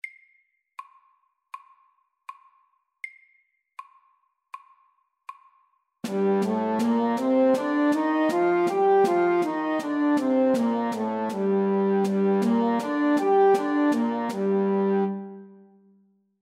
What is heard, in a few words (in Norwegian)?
G moll MIDDELS